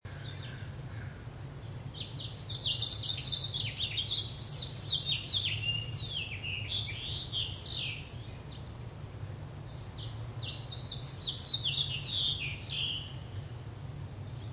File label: birds